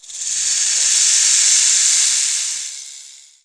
MYSTERY

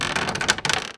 door open

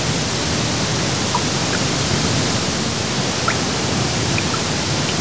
waterfall cave

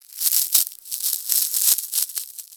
wood crackle

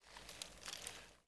RUSTLING

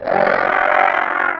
die; mahlendur

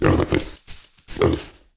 sniff

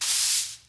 SWEEP

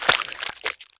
STOMP; mahlendur

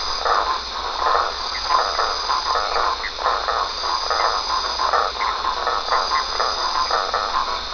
FROGS AND INSECTS

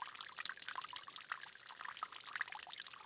piss loop

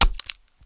hurt; mahlendur

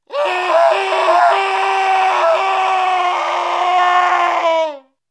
ZOM DIE

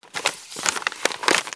paper handle

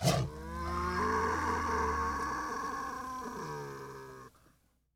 DIE

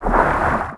swim underwater

